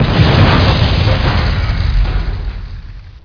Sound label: grenade explode; zombie plague